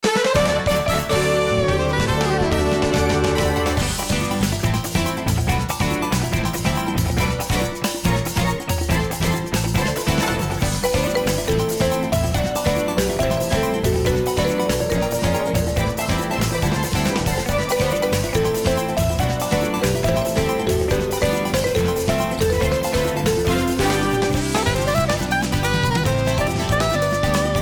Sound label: Beach BGM Arrangement; NSMBW